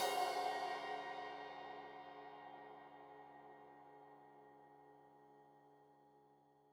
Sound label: Crash 15in L edge, Cymbals Stereo